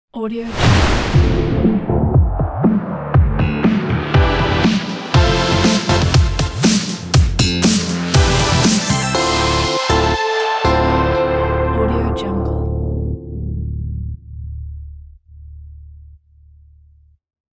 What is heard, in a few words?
energetic logo